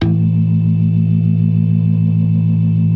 DRAWBAR VIB, Synth and Organ

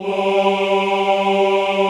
OHH G2, Classical Choir; Best Service; Extended Classical Choir

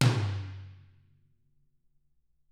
Toms; Bob Clearmountain Drums I

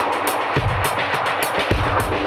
Beat FX B 105, 105bpm; musicradar; dub designer; Beats

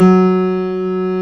KLAVINS F#3; East West - Global Sounds

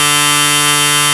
rave beep D2; Best Service Alpha Dance vol.2

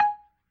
G#3 PRC, B3 PERC; Big Fish Audio - First Call Vintage Keyboards

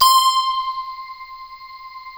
POLY DE.10; Masterbits; Vintage Voltage

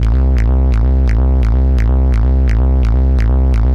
Bass, 128bpm, A1, analogue circuit; musicradar

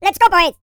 YODEL YELL, World Voices

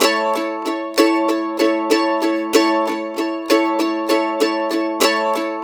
Blues Guitar Samples, RIFF; ILIO; Fretworks; CEE RIFF

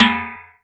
Roland R-5 Can, Drums

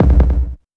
Kick; Esoterik Beatz; PH7 Kit

